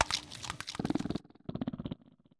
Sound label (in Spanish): Grito de Bombirdier, Pokémon; Gritos de Pokémon de la novena generación